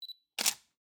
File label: Camera Shutter